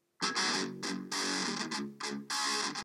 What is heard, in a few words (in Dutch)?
Muziekfragment Rock